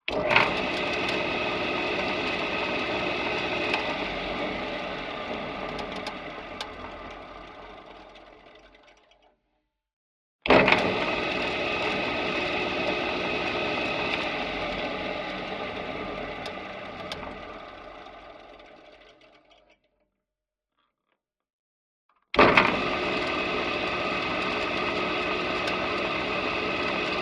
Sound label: Table Saw Starts and Stops Contact; Collected Transients; Machine Emanation